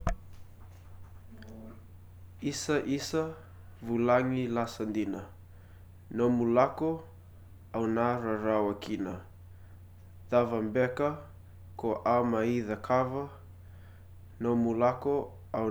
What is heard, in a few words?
Isa Lei, Fijian - Story/Song, Farewell Song; Isa Isa vulagi lasa dina